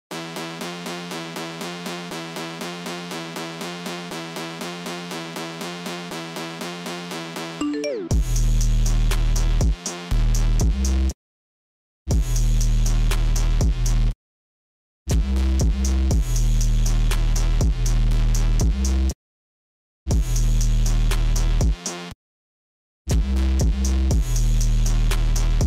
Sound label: Instrumental